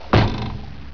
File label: trucks; GRSITES Graphics